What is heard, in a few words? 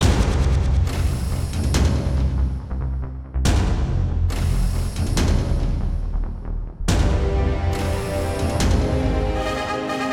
Walking Percussive